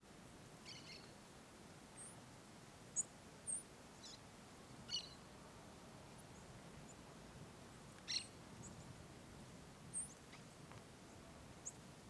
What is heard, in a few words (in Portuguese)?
Ambiente com passaros em fazenda vento dia